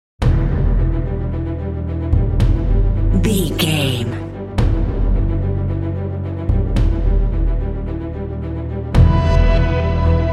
epic cinematic trailer action

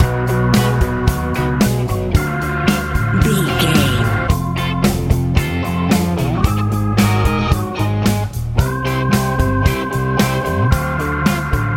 country rock

